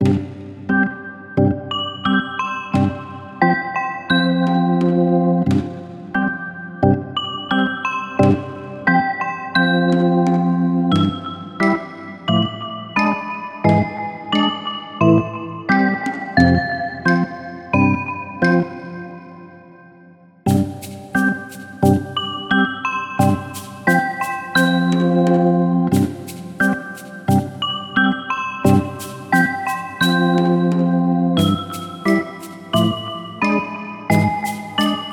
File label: plop